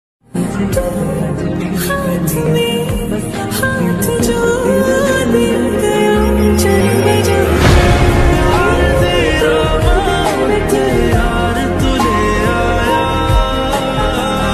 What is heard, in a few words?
lofi ringtone hindi lofi ringtone bgm song ringtone